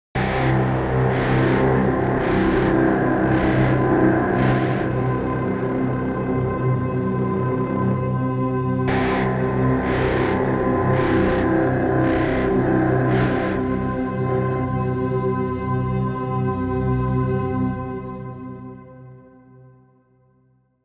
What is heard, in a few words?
Loading; dying light gamerip 2015